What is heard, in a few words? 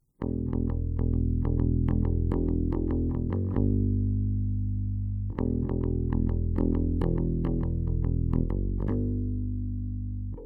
bass